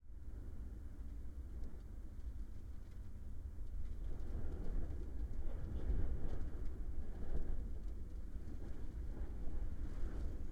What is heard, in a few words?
Wind, Sleet, Int, Urban, Rushing, Gusts; Hzandbits; Howling Winds - Interior